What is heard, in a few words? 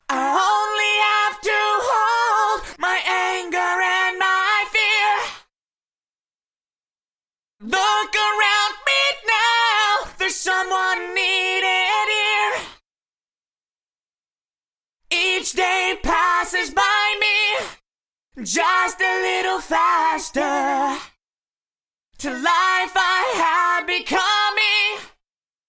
Eventide H910 Vox Maj3 Up